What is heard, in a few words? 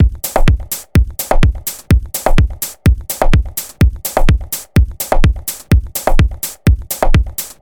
126 Bpm Classic Analog House